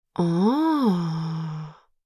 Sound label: Satisfied female vocalization